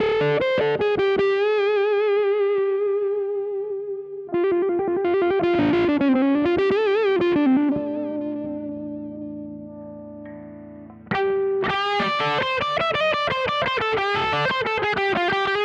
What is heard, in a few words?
Harmony Guitars Bridge Tone Switch